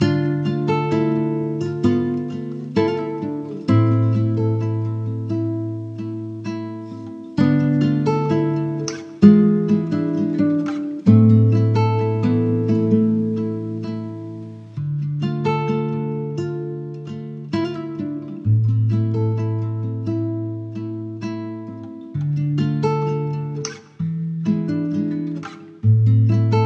130 BPM A Min, Guitar Loops; Empire; Cymatics; Journey Guitar Loops

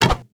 Attack Bow; Game Essentials

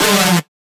synth screech oneshot D dry; Synth Hits; GhostHack

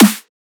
Snare Dubstep; Trap and Dubstep

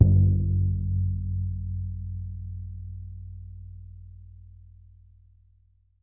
Frame Drum - F#; percussion; Cymatics - Infinity Beta Pack 2.0; Zeus Percussion Collection

